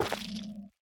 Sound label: Sculk Sensor place